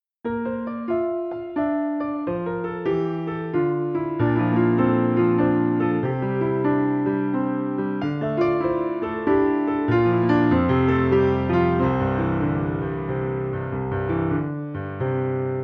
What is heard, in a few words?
Piano